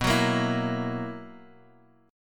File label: B Major 7th Suspended 4th, Guitar DADGAD chord